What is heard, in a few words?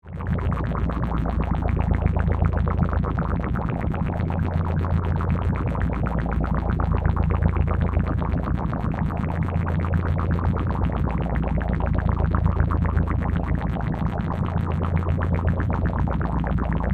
Drone Flange Gurgle, Scifi